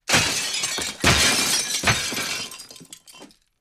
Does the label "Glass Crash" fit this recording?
Yes